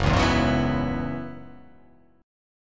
F sharp 7th Suspended 2nd Suspended 4th F sharp down